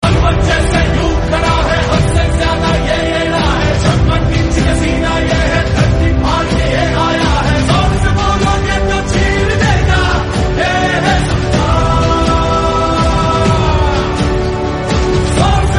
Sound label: kannada; KGF